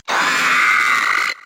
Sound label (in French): Cri